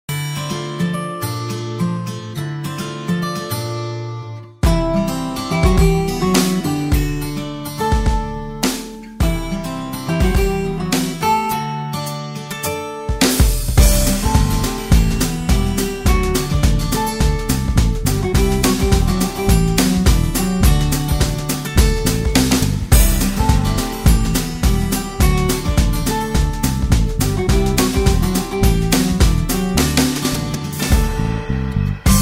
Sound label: jingle